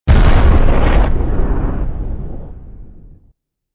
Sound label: sfx explosion reverb; djdrops247